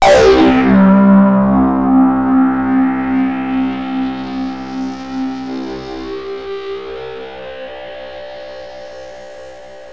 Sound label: Access Virus B; Synthworx